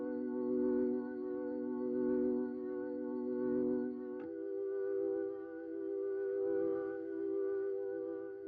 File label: Organ